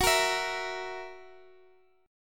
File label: F sharp 7th, Guitar, DADGAD; x,x,x,11,13,14